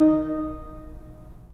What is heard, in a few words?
Pipe Mix Celeste, 3D Organs; E-MU Producer Series Vol. 5; 3-D Audio Collection